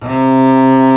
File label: STR BOW, Str Quartet, Strings